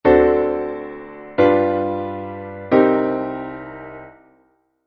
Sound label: minor double dominant